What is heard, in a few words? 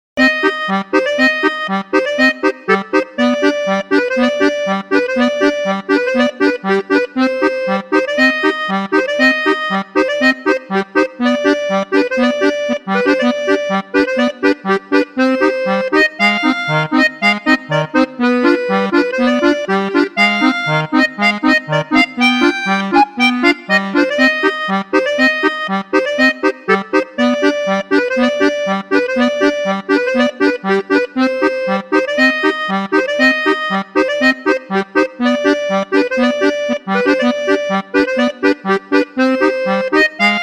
quirky accordion solo